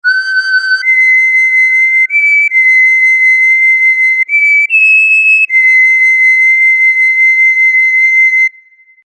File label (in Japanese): 草笛短調; おまけSE